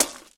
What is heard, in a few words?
gravel step; Golden Crayon Sounds